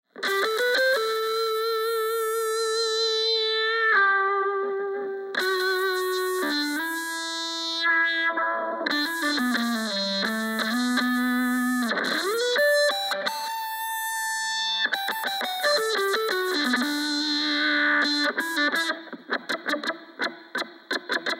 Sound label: high gain, fuzz; lee cosmic level fuzz; marco dodin